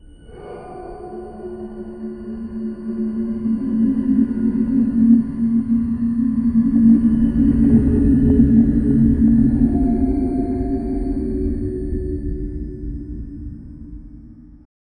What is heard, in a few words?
create a spine chilling h